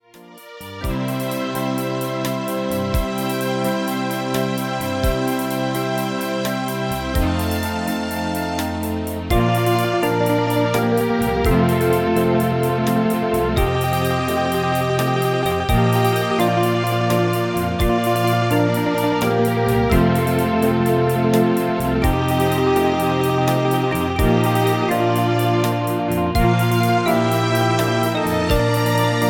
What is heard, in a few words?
MIDI